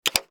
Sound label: click